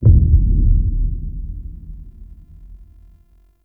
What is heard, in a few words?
Menu Stinger; Warframe; Cinematics; DuviriQuest; Courtyard